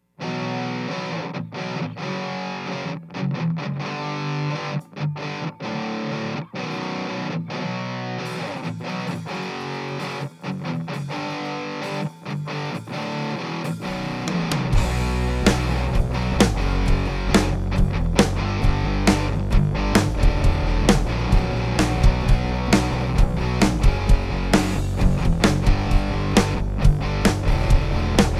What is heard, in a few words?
Groove